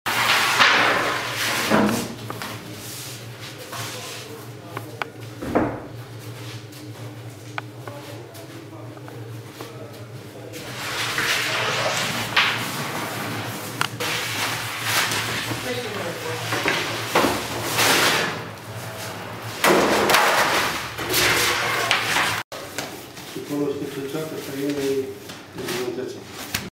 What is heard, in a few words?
Wood Fired Oven